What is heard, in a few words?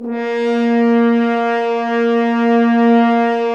BRS FHRNF, FHns Dry f; Roland LCDP06 Brass Sections; F.Horns 2 f